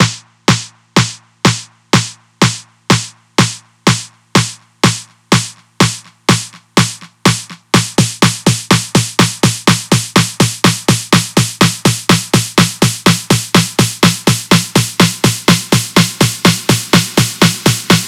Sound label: Snare Build WET; Heatwave